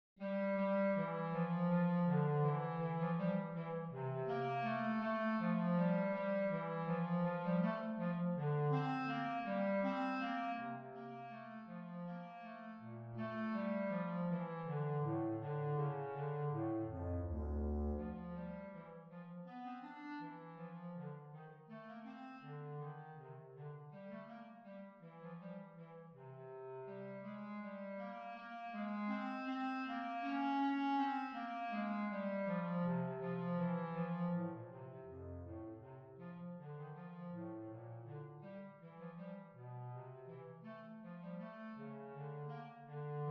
Bass Clarinet Slow

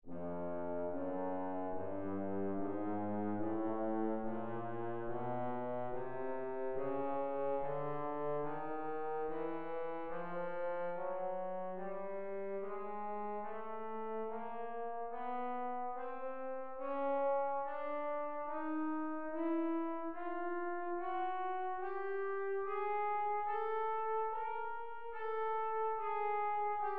Trombone Chromatic Scale

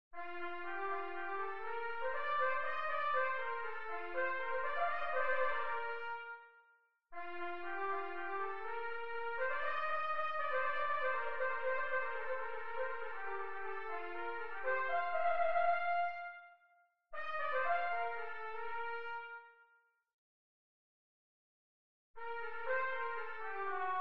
Trumpet Excerpt

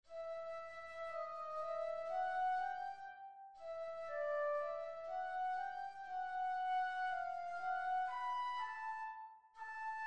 Flute Track

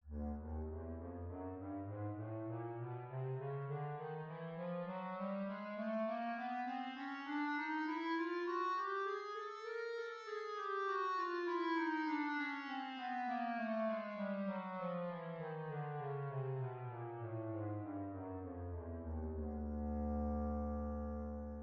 Bass Clarinet Chromatic Scale

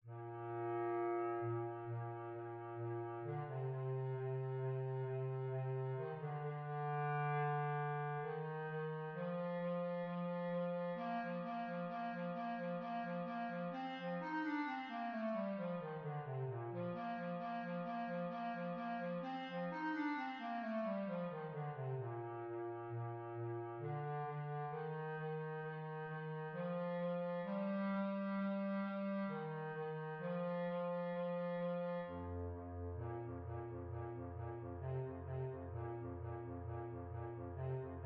Bass Clarinet Excerpt

